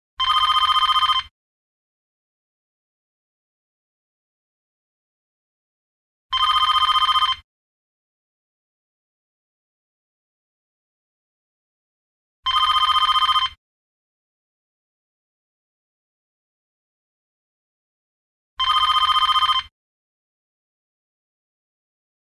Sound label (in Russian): phone